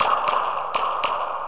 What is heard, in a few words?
Spring, Acoustic Beats; Sonic Foundry (Sony Creative Software); Myths of Technology